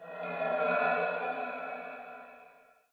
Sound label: breathe